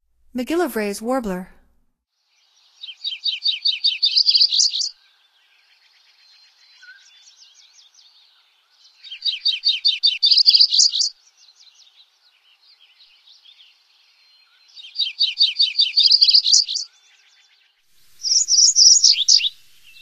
MacGillivrays Warbler, Songs of Birds of North America; Stokes